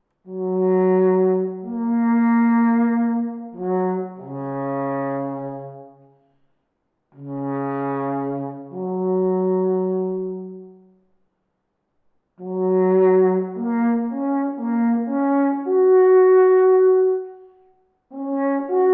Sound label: Alphorn